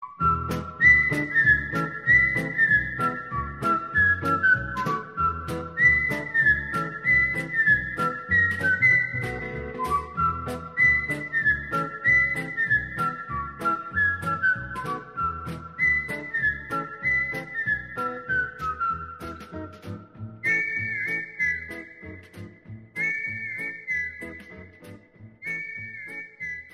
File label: Whistle Cartoon